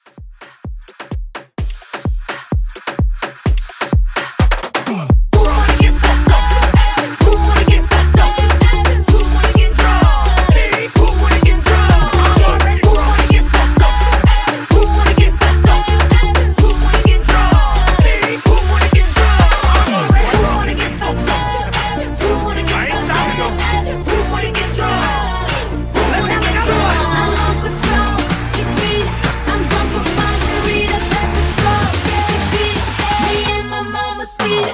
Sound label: Afro Mashup Reboot 128 DIRTY